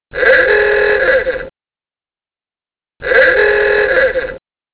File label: old clacson